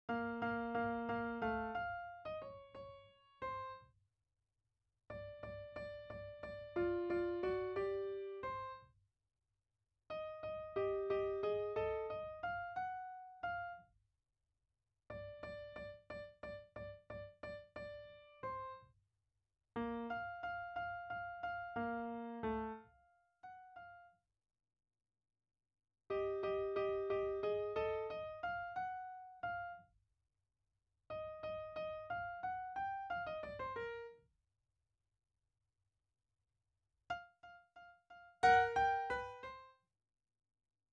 Sinfonia n. 3 Opus 55 in mi bemolle maggiore; Eroica; I Movimento; II soggetto

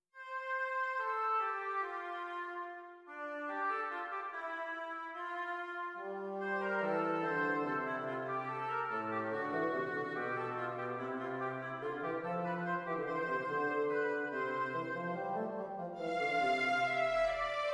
Orchestrazione; Ronge; Gerd Prengel

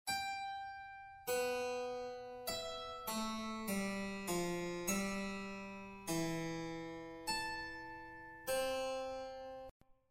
Rivolto alla duodecima superiore

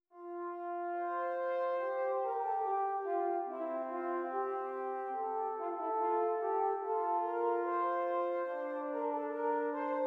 Esercizi di contrappunto a due voci; Funftel Gattung; Ronge pagina 60

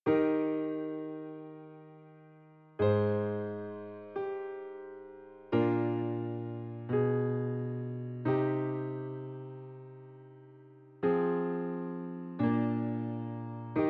Adagio per pianoforte; Hoopner Chr. Gottlob

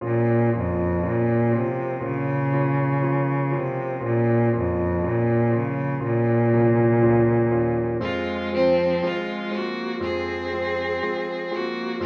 Andante per un Piano Trio; ricostruzione Graziano Denini

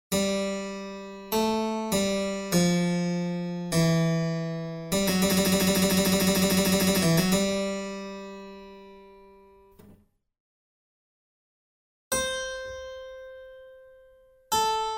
Tema e risposta

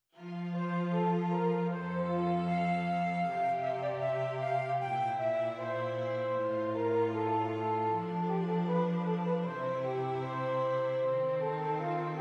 esercizi di contrappunto semplice A tre voci

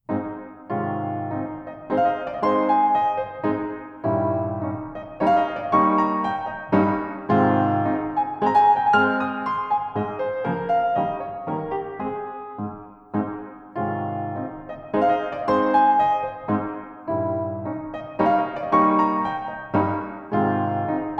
Valzer; von Leopold Bohm

